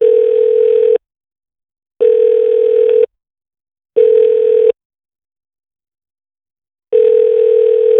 phone call sounds

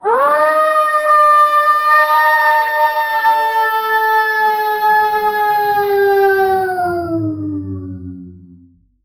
draconic creature

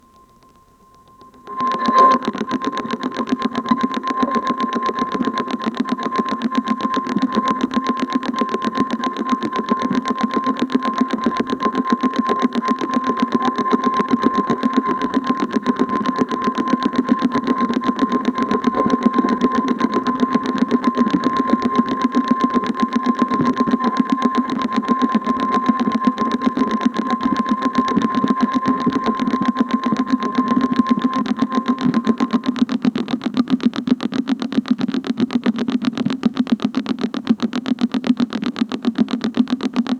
direction finder sounds, navigation

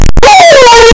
wolf damage yelp canine

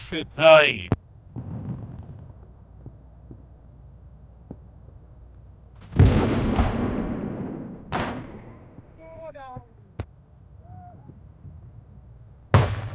outside the tent distant, arrow whistling

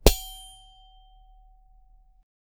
a single swing of a, axe

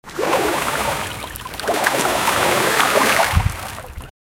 fins swimming on water, bubbles

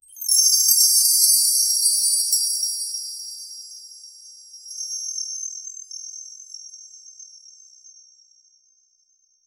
soft sparkling sound effe

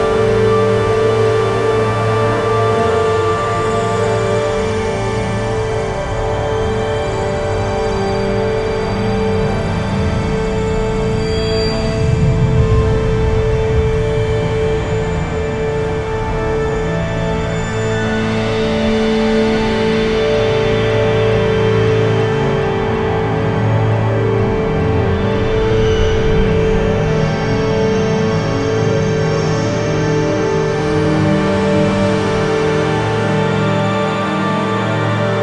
emotional sci fi warzone; orchestral music

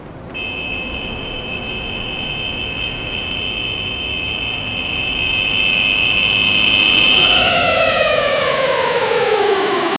airplane engine failing

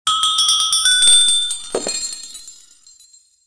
a diwaali celebration, festival

